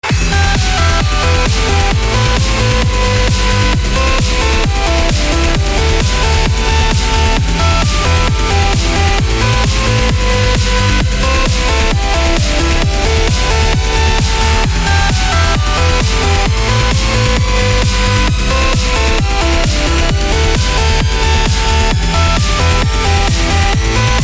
uplifting trance theme

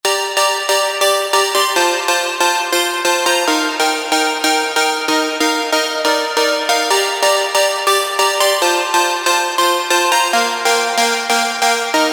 140 diatonic plucks limiter

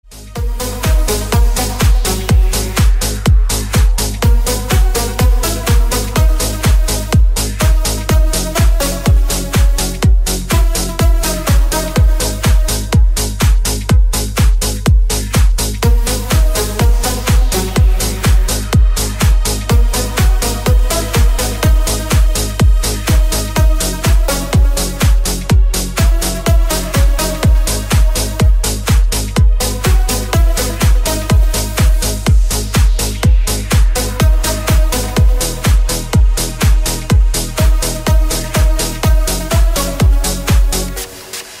instrumental

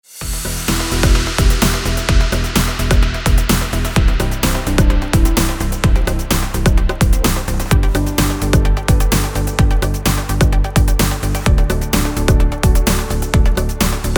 dub mix

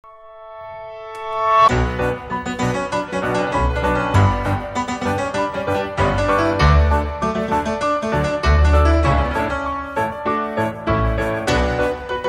piano cover

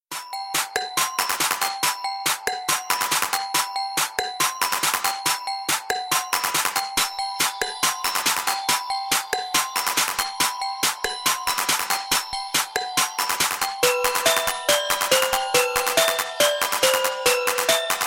drum machine instrumental

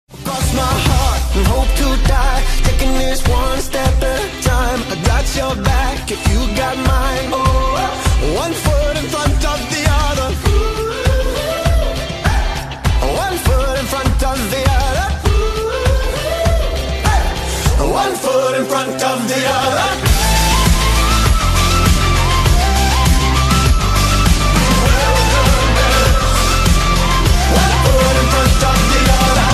indie rock

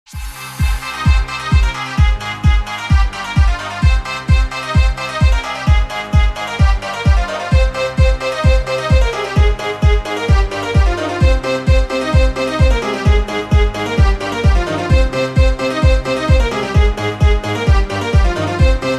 fiddle

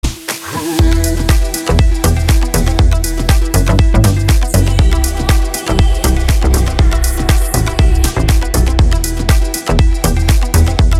techno

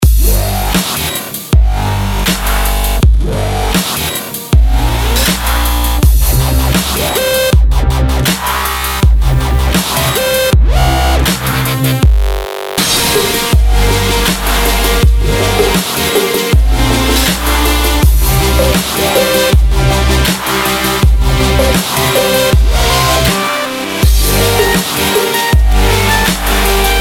detuned

